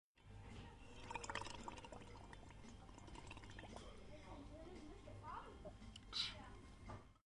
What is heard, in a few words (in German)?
trinken